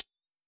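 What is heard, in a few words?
analog hi-hat closed; AnlgKit1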